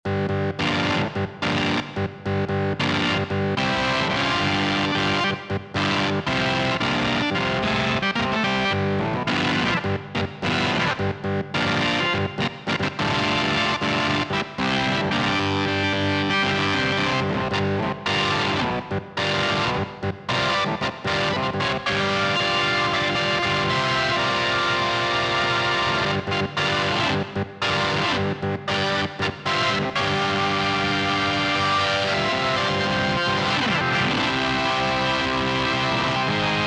Roland SRX-07 Ultimate Keys; Smoked Water

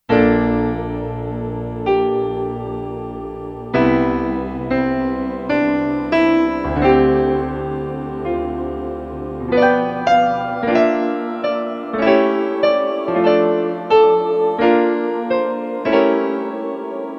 Classic Piano & Vox, Kurzweil K2500X; Factory Programs

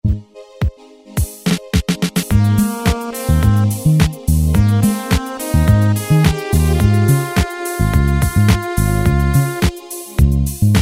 disco, Technics SX-K450 Automatic Rhythms

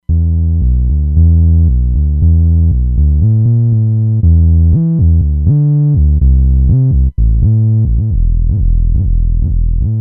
Roland Fantom XR

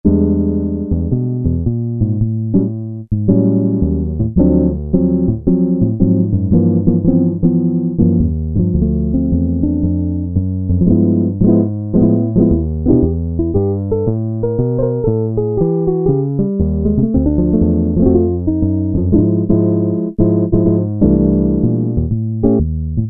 Jazz Guitar, Korg Poly-800

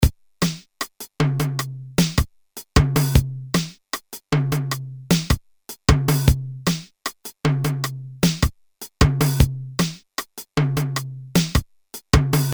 Cuban, Boss DR-220A Preset Patterns

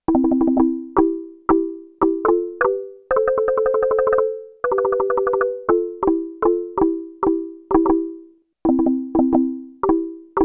Marimba, Roland JX-8P

